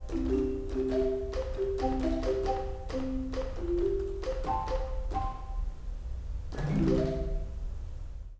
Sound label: marimba